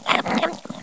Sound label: health bonus; Ferazel's Wand Demo